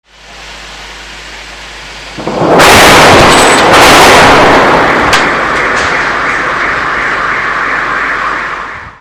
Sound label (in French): bombe